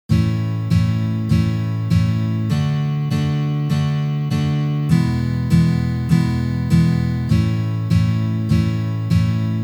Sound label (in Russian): Uprazhneniya dlya igry akkorda Lya mazhor na gitare